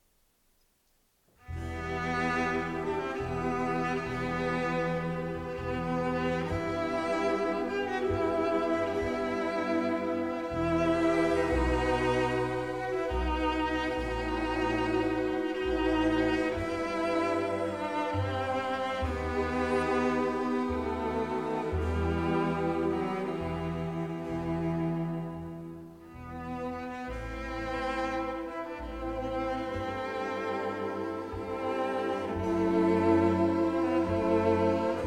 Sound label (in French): Vivaldi Sonate Violoncelle; RV 40